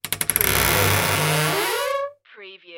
creaky wooden closet door

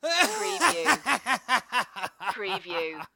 Laugh Male, Laughter, Laughing Male

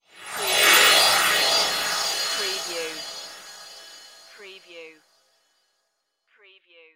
Magical Twinkle Whoosh

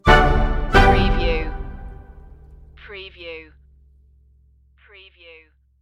lightly macabre stinger

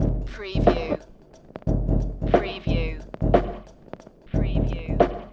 Hip Hop Drum Loop 90 BPM - Swing Lo-Fi

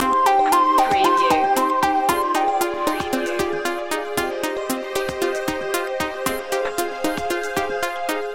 alarming news loop

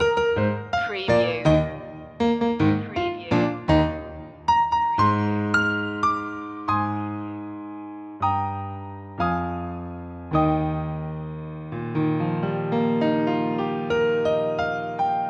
we wish you a merry christmas piano loop end; media music now

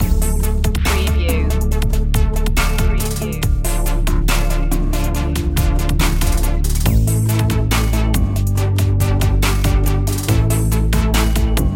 so hip loop

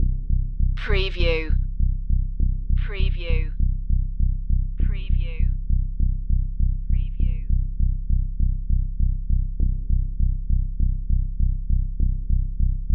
tension pulse loop

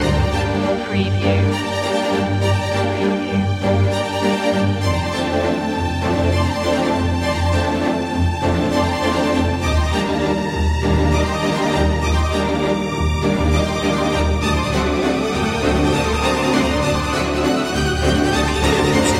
the storm is coming loop

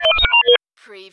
Servo Panel Sound - Access

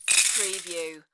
Slot Machine Win - Coins Falling Into Payout Slot

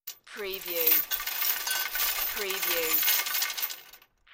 Drag Chain Slow With Metal Rattle

